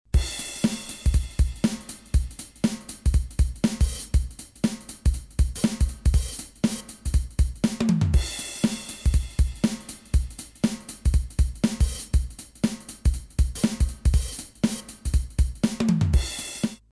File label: Mooer Synth Drum Loop Funk